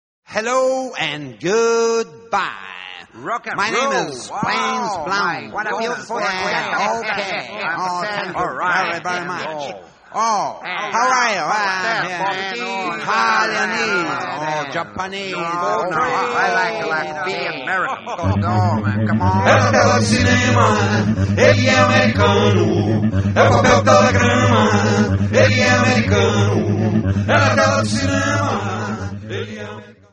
donkey beat

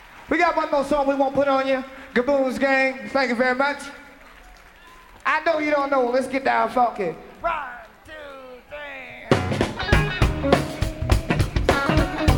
funk

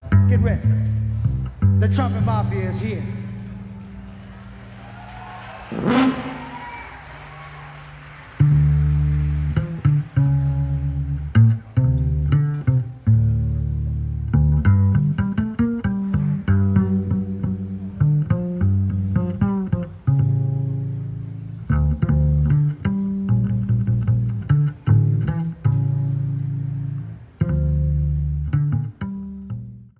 trumpet

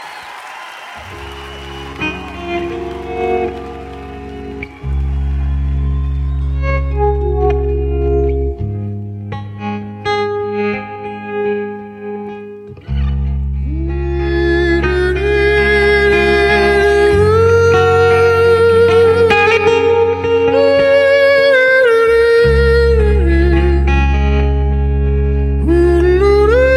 solo

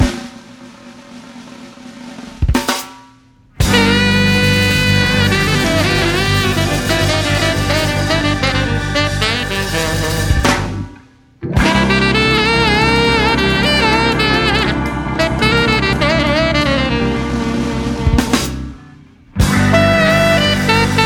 funky

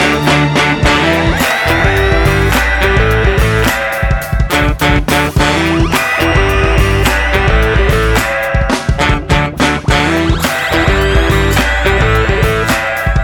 creep funk

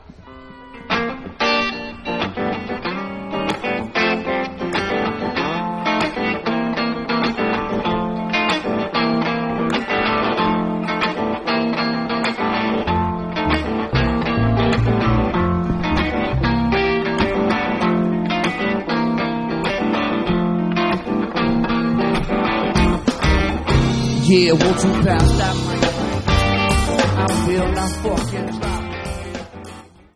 glove jazz

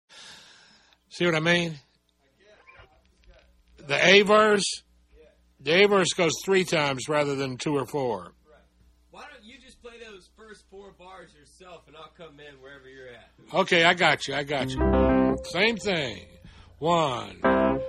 jungle

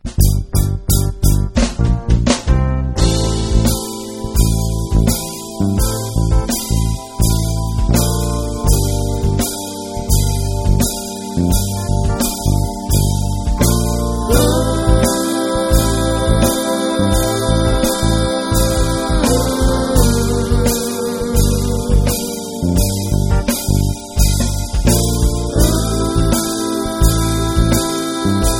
scifi

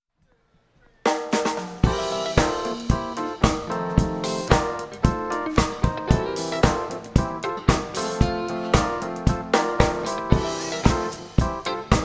jazz blues

